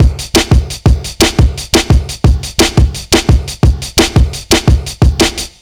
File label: 173 BREAK, Explosive Jungle; USB Soundscan vol.05; AKAI